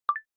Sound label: Video Record, ui; Coolpad; ESurfing-No1-2021